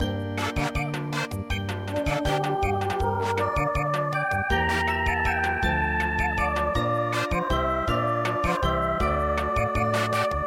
Sicilienne Samba

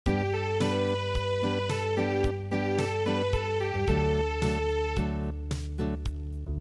Unplugged

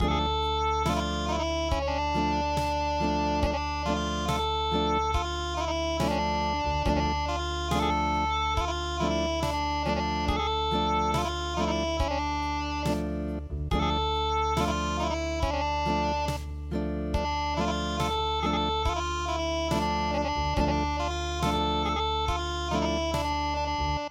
Acoustic

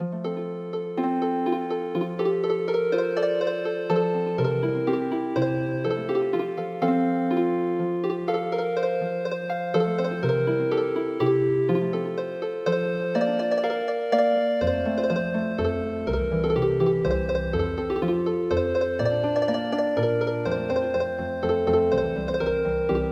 Healing Harp, traditional; The Bold Fisherman

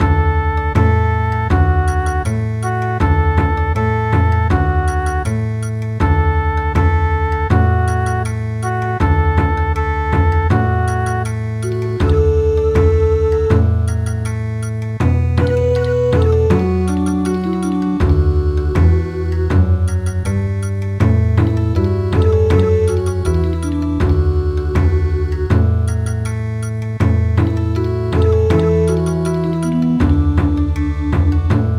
Bon Odori, midi; A Sing Sing So

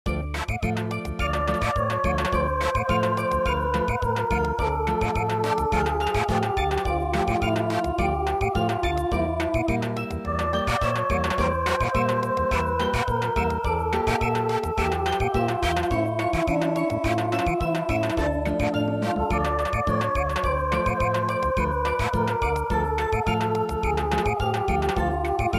Samba

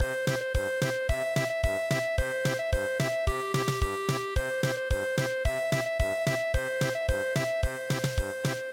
seiro gan polka

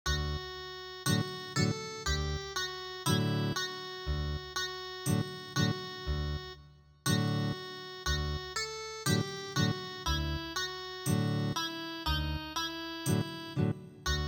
turkish fishermans song Ethnic Guitar

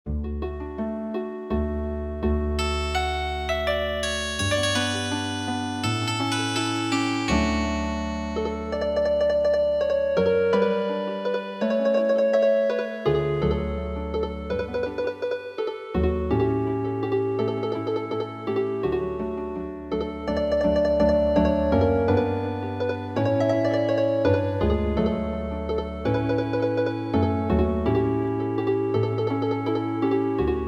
Healing Harp

